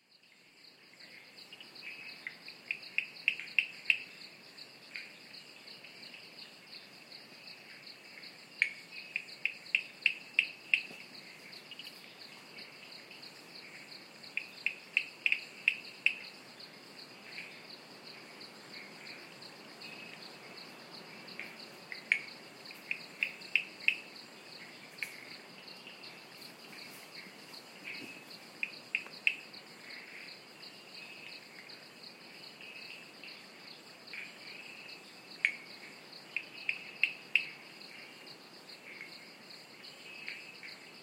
Knuckles night soundscape; Zoom MS st; Sri Lanka Wildlife Sound Recording – The locations

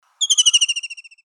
White breasted kingfisher

